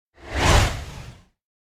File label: Whoosh By Growl Breath Fast; Hollywood Edge; sound designer's toolkit v2